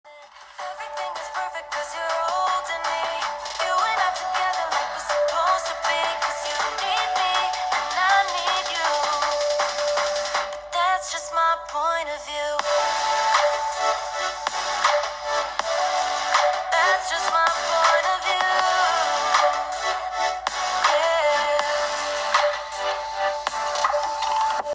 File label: arrows We Speaker